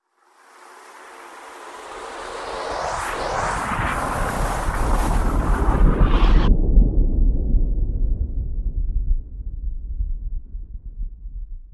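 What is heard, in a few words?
sounds of meteorite asteroid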